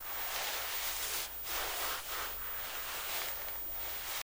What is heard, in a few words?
Clothes Mvmt Soft